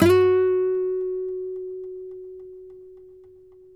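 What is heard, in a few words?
nylon, FLAMENCO, Guitars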